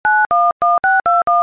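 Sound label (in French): Compose numero, Téléphone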